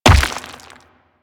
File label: Rock Elemental Footstep Default; Warframe; Brawler